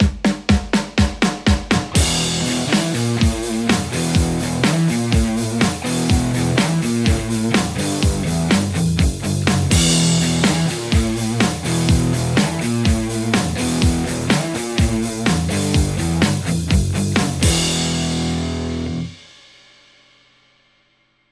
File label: Les Paul crunch; aradaz; crunch v1 4